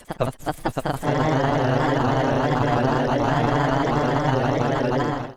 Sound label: aaaaa